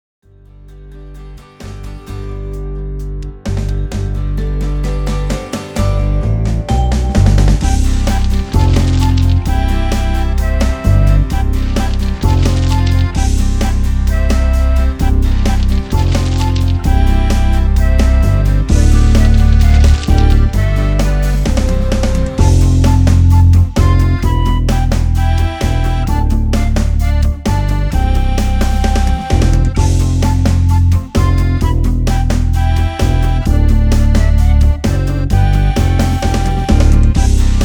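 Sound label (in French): Vole trotte nage, Version instrumentale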